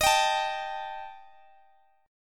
D sharp Major Flat 5th D sharp Guitar DADGAD, chord; chordshape; x,x,x,20,22,19